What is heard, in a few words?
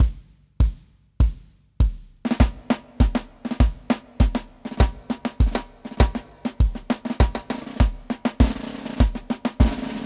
march two-step